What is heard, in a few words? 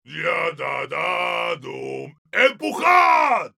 Heavy singing, es; La canción del Navegante Volga de Mily Balakirev; ¡Empujad!